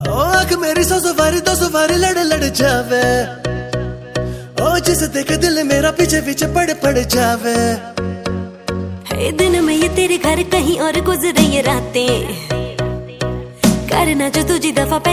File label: bollywood song ringtone